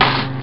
Spit; McGee 2; Clr Bathroom

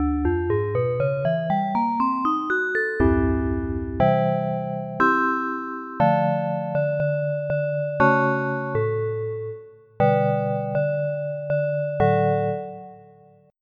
Vibe; Onj's Soundfont

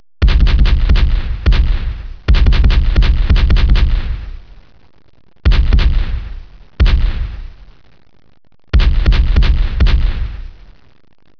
CANNON

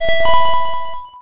Power Up; smartpandademo

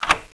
lock

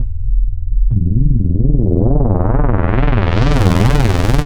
rise, Synth

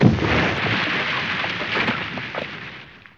Stone; Waterloo

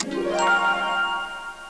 New Mail Sound; Win3d; Venus

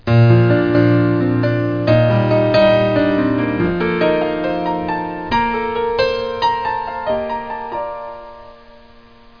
Piano Riff; pianos

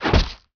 archer hit; ZEUS

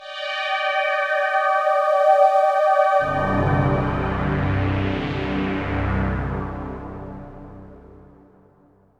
SYNTHPAD AMBNT 160 C; eJay; Ambient; Sphere

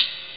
tsing, cymbals

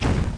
bzk fire; hcombat

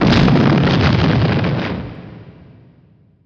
blast; groundwar

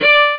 viola

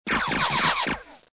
explo; PCGAMER Disc 2.11 DEC 1996; BGW DEMO

